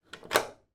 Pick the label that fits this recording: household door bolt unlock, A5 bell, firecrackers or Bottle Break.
household door bolt unlock